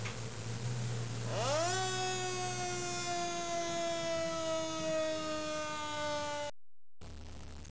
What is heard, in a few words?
siren